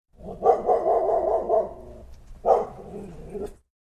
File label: large dog barks in from nearby yard